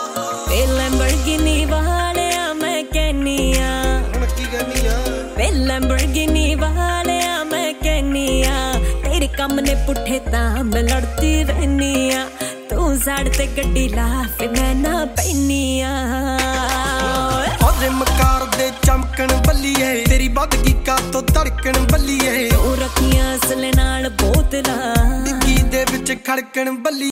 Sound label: new punjabi song ringtone